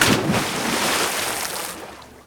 shallow fall; firefight

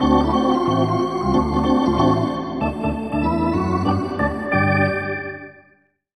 Hockey Organ

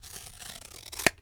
Cutting Cardboard with Scissors; Office Supplies; 1001 Sound Effects

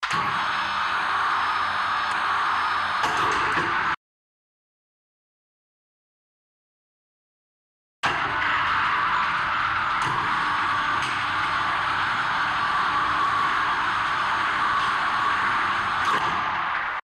airlock gate open cargo bay; Code7

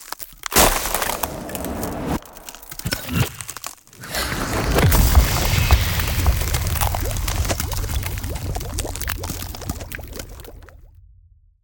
Ice Spell Transformation Shape Shifting; Arctic Aura

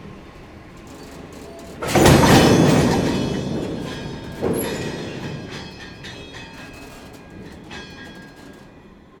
shunting track, train; best service Blue Box

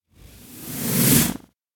Whoosh Thin And Fast Stopping; Hollywood Edge; sound designer's toolkit v2

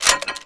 Gun Ammo Pickup, Weapons Foley; SFX Kit

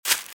Velociraptor - Footstep - Grass - Run - Left

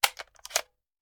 Machine Gun Foley; Sound Ideas; Guns

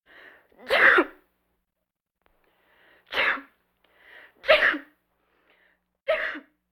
Vocal effects breathing Moaning Baby

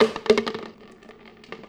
Roll Popcorn Bucket; SFX Kit; Foley - Misc

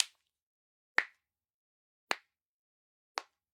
Organic Finger Clicks; boom library; The Interface

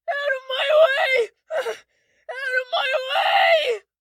town human far; Blind Drive

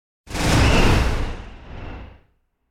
Whoosh Sharp Flying Off; Hollywood Edge; sound designer's toolkit v2

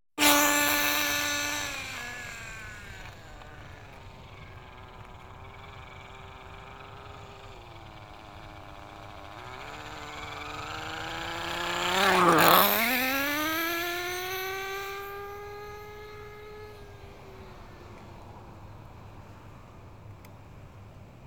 Toy Car With Remote Control; KMP Film Video Sound Effects

